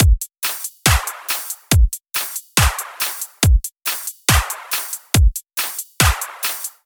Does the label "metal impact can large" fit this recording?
No